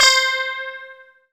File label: GUI CLEAN, E-Guitar